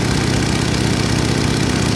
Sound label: biplane; Colin McRae Rally 3; XBOX